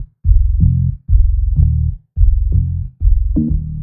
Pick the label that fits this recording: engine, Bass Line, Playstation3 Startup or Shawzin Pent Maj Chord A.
Bass Line